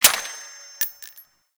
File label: Quad Sniper Reload Clip Out; Warframe; Weapons; Tenno